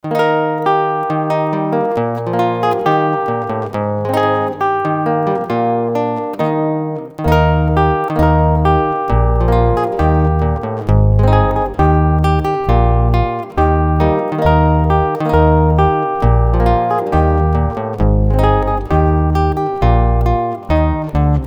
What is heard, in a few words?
Instrumental